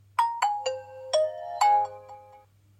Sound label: nokia tone